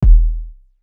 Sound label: BD kick